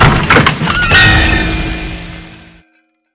wheel Stop Regular; wild wild west

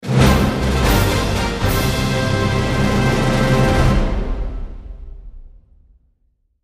intro Free Spin; excalibur

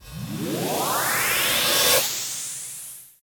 big win ramp up; fireflies

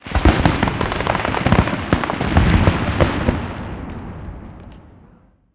fireworks; vegas night life

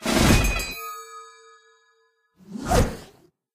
hot Wheels; willyshotchillies

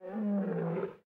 animals lion; serengetikings

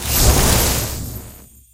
scatter free spin; parthenon

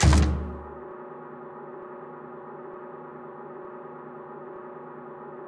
spin Button Click; victorious

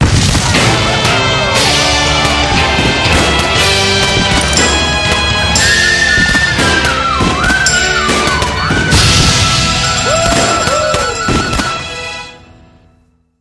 big win no loop; wild wild west

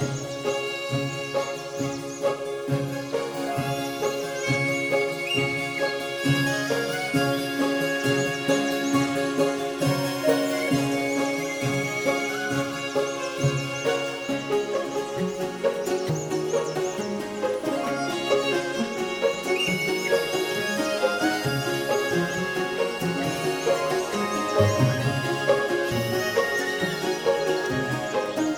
main loop; irish pot luck

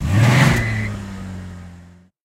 freespin Car; hotline2

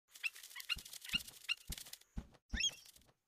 wind Sym Idle Anim; elements

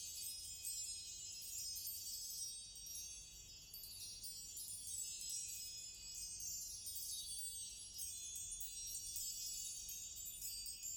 outro Free Spin Loop; secrets of christmas

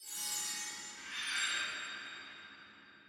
logo In; codex of fortune

